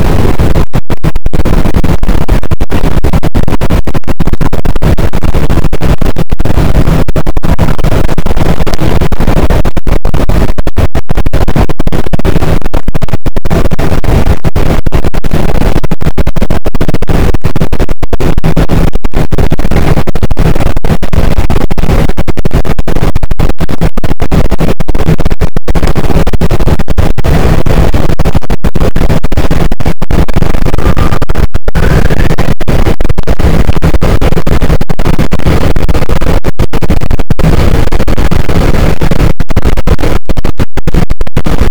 cachalot positif, boat; Physeter Macrocephalus

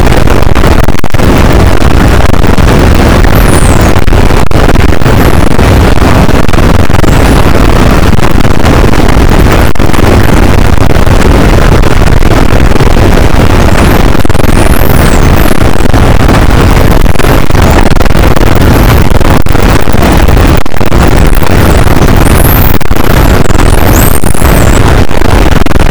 cachalot negatif, boat, Physeter Macrocephalus

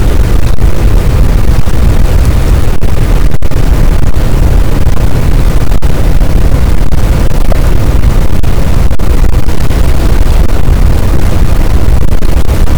cachalot positif, boat, Physeter Macrocephalus

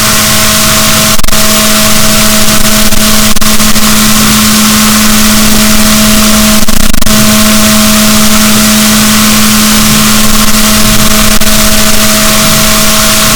rorquals, boat, Balaenoptera Physalus; SNR 6dB

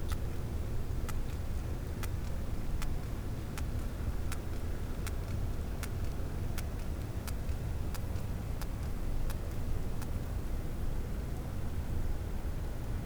cachalot positif, Physeter Macrocephalus

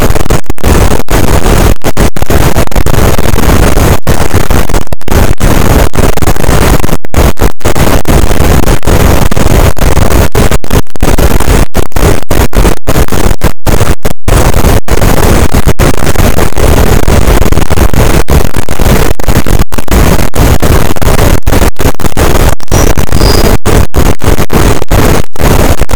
cachalot negatif, boat, Physeter Macrocephalus

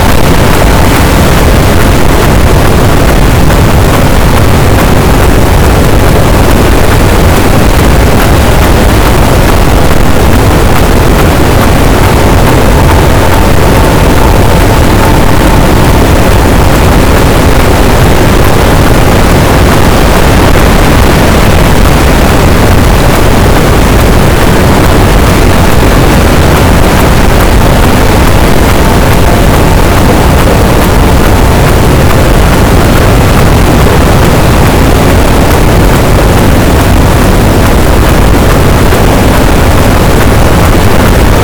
cachalot, boat; Physeter Macrocephalus

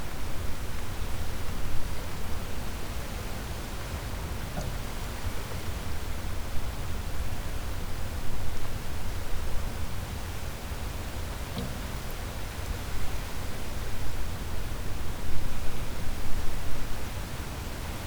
cachalot positif, Physeter Macrocephalus

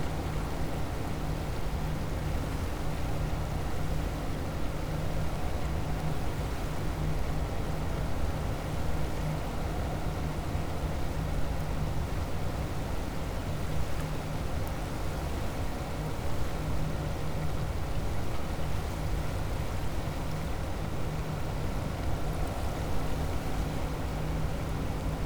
cachalot negatif, Physeter Macrocephalus

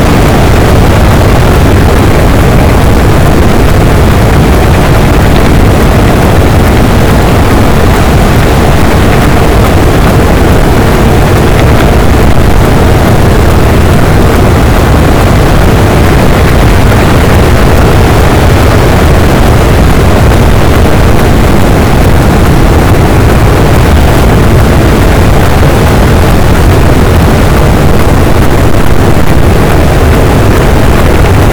cachalot, boat; Physeter Macrocephalus

